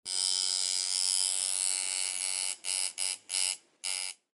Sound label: tattoo payment